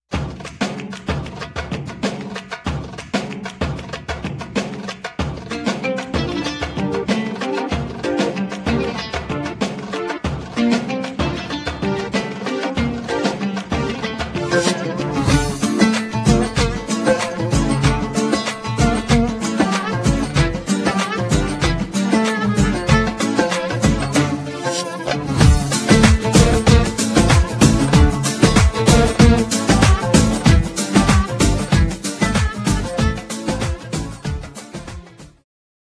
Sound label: turkish